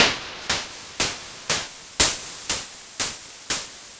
Gated Seq Noise, 120BPM

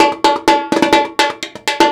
Percussion Loops, Brazilian; Houseworx

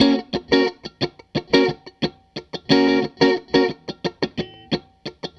Strat Guitar Loop

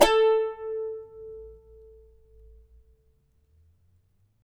CHARANGO A 3; Spectrasonics; Hans Zimmer Guitars VOL-2

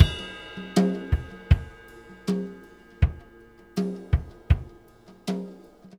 Tom Groove, Laidback

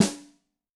Snare, Yamaha DTXpress-II

